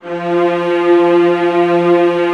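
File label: VIOLAS G3, Strings; ILIO; Synclavier Strings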